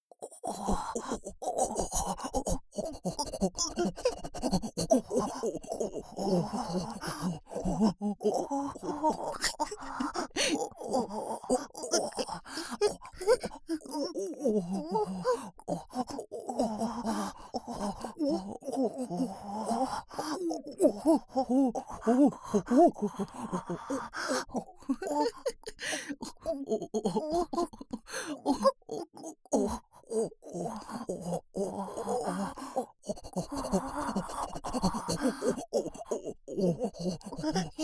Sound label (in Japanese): オホ声